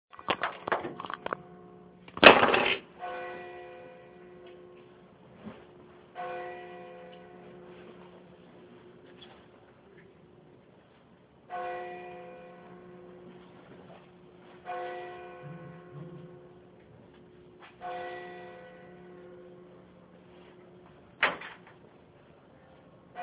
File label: angelus bells in ennis co clare